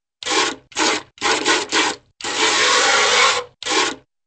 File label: rhythmic cow milking